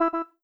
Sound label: error